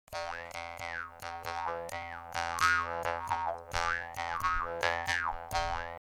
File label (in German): Maultrommel